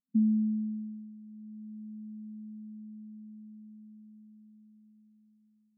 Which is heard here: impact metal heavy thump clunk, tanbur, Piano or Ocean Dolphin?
tanbur